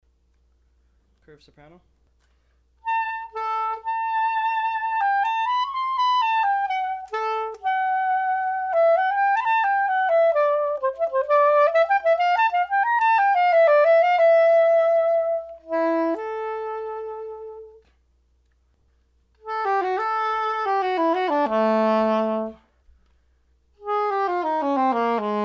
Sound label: curved soprano